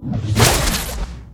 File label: Web Launch Shot, Weapons